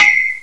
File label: FLAGPOLE